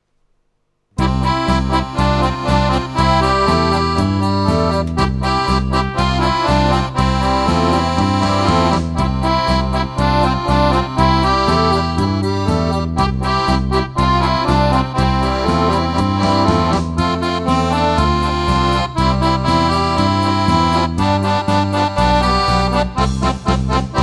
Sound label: Country